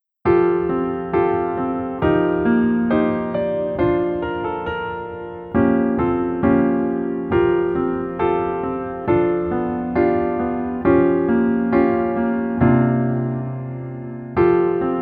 Piano ver 여자키

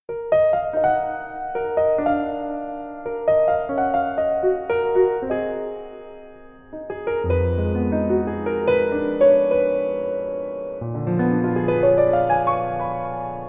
Piano ver 멜로디MR 남자키

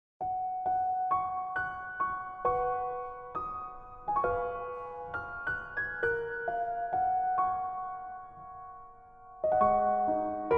Piano ver 멜로디MR

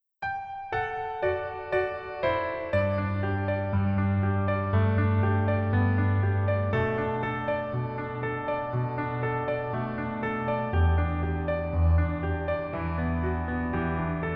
Piano ver, 멜로디MR, -1키; 더킹, 영원의 군주

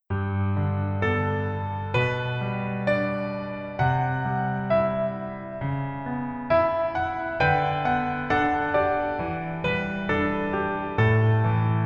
Piano ver 멜로디 MR; 슬기로운 의사생활

